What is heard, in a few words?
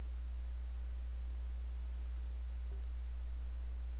sea